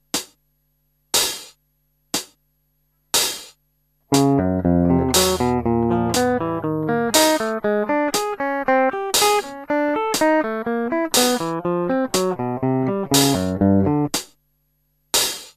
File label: finger easy